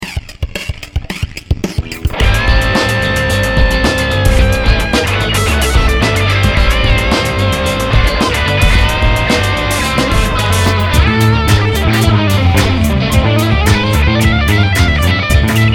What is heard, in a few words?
pad